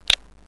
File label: canonet, shutter